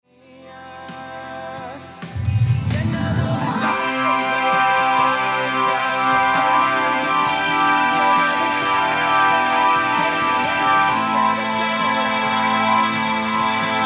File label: Hammond Mellotron